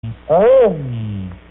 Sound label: She Saw Me Expression on Saw, voder